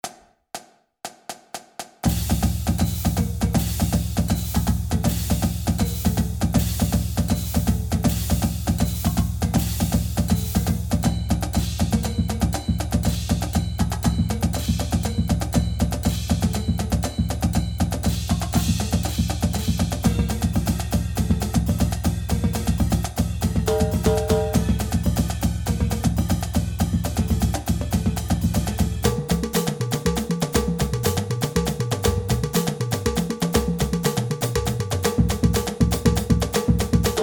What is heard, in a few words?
Double Triple 120, drumline